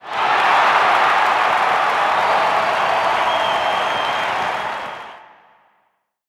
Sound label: Cheer; Pac-Man Championship Edition 2 Plus